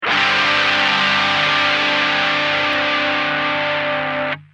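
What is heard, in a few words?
guitar dod690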